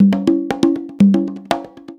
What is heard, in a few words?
120 BONGO; Best Service Advanced Media Trax Modern Composer